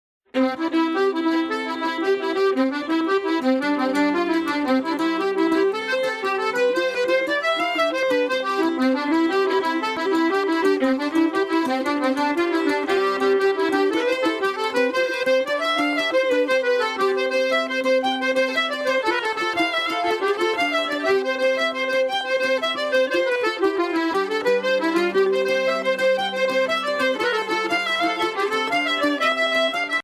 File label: Jigs